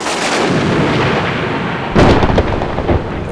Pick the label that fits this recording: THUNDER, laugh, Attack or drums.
THUNDER